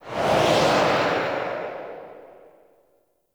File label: Travel Whoosh Left; Reaper; Warframe; Wraith